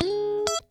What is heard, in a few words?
Funk Guitar; 90 s Sample CDs; Zero G